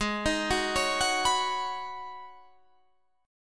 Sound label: Ab Minor 11th, Ab, guitar chord